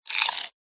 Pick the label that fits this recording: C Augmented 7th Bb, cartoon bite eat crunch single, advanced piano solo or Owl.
cartoon bite eat crunch single